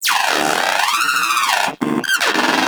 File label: Moa Aggressive Vocal Enemy Killed; Warframe; Characters; Pets